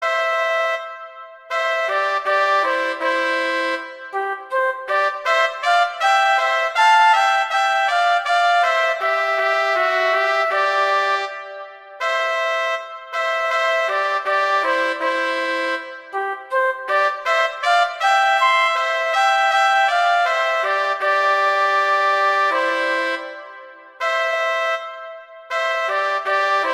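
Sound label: Duet, horn; Mozart; Duets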